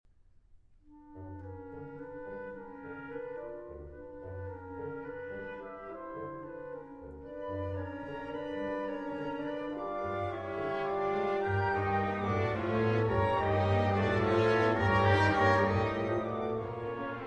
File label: Dance Variations Waltz